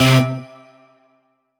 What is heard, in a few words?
JINGLE battle; beyblade x xone